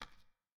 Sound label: Fight Step; Game rip; MiSide